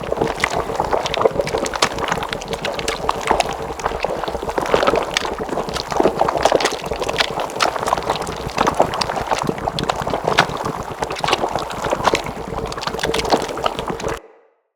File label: Ruins Sludge Fall Loop; Props